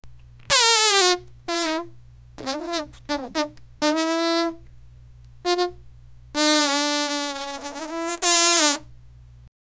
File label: very cute chub, round